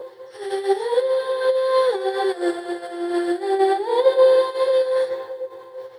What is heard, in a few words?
vocal on; lofi drifter; yum audio